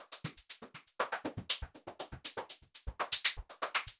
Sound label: Anabeat Hat; Beats; Sonic Foundry (Sony Creative Software); Prototechno